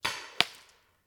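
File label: Paintball Gun Impact; Sound Ideas; Guns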